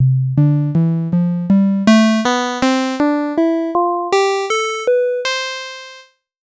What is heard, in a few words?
mono synth; reaper